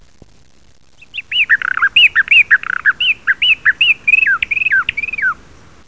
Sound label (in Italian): Bigia grossa, uccelli